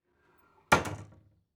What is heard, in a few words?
Door Cabinet Door Close; household